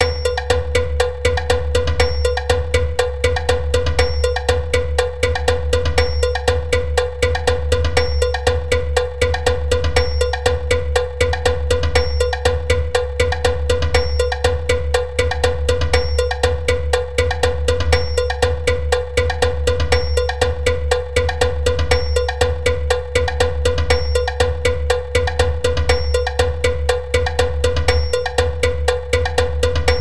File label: instruments